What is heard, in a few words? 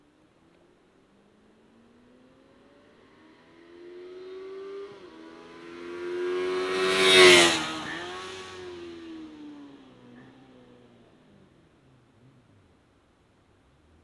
passing, CBX